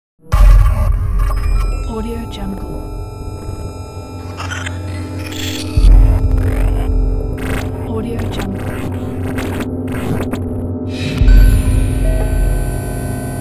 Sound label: glitch logo